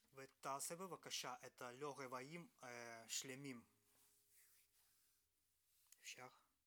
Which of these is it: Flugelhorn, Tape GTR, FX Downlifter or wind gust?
Tape GTR